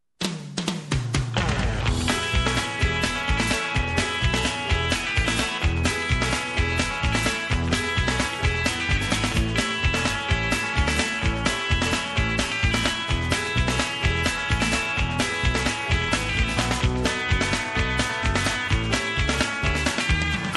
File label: Rockabilly